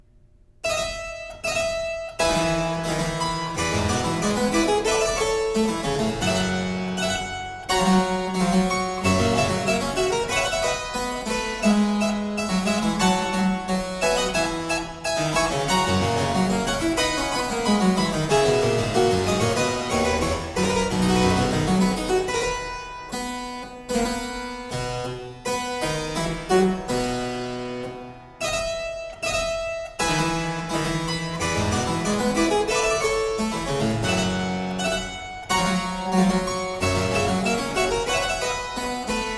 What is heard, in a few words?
Five pieces, March, Harpsichord music